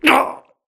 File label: grunt death quick; Titanfall 2